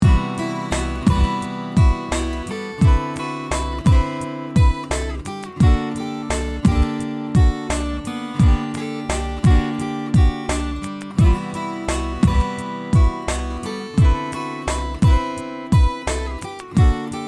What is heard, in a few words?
antelope verge Aalborg 4006